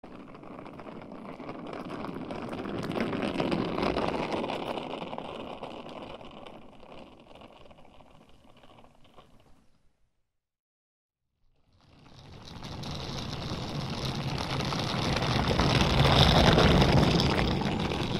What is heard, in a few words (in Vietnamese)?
tong hop tieng keo vali